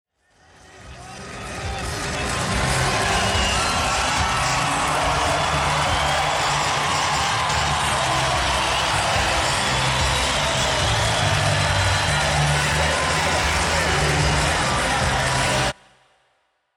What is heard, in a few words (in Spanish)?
multitud gritando; charales